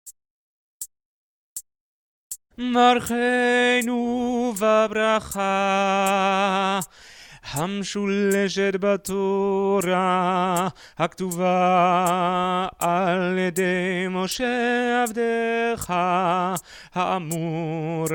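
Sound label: soprano; priestly blessing; BCC choir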